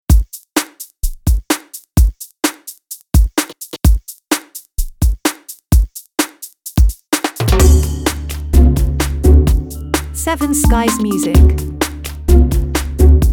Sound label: Light Comedy